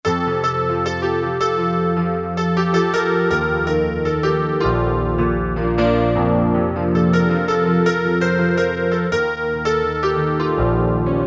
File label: DDL PIANO, Korg DSS-1